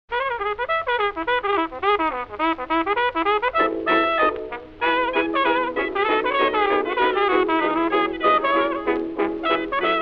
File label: Cornet